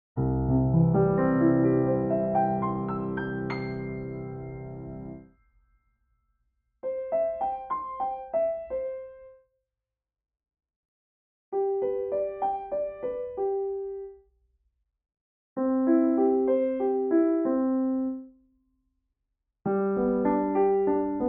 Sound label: Piano Dry